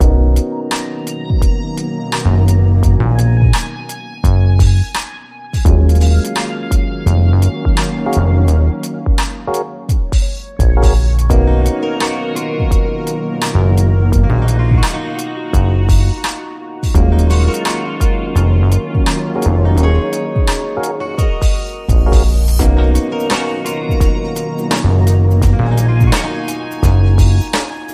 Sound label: NeoSoul No Vocal FX